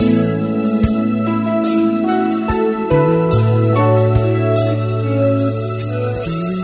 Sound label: keys, phonky loops